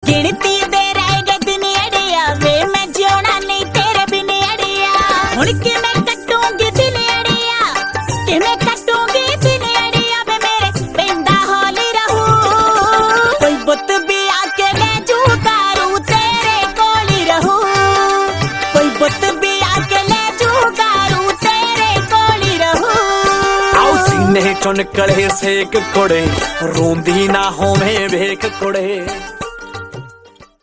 Song Ringtone

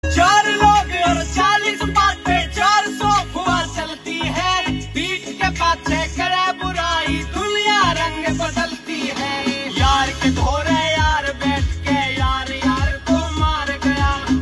Ringtone

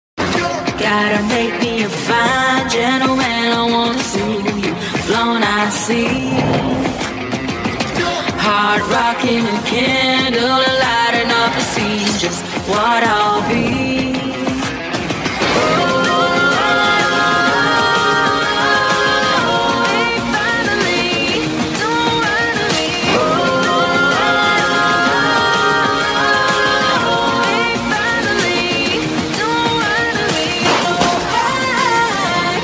Hindi Ringtone

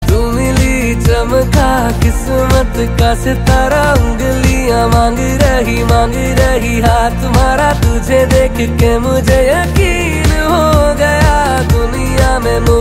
Ringtone

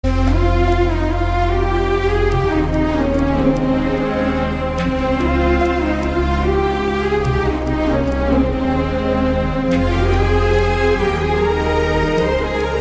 Bgm Ringtone

